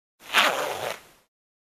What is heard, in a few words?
Clothe Zipper